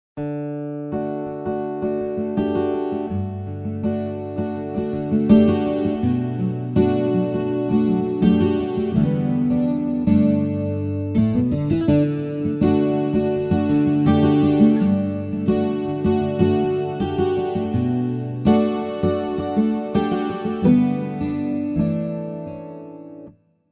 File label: American steel duo, guitares; Montage